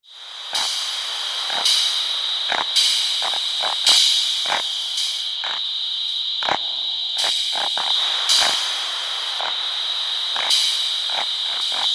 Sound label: noisetar dream